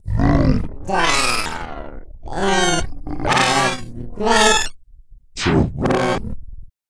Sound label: PITCH MOD; ILIO; Vocal Planet VOL-4; R&B & Dance; SCI-FI CHZ